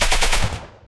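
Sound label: powerful and clean smg gunshot; treble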